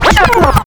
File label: backscratch, scratches; Zero-G; Freak Beats